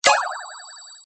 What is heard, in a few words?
star earned; Nickelodeon - Back to School Search